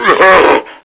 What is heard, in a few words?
hurt, zombie; Zombie Darkness; hump back